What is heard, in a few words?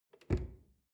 drawer large close lock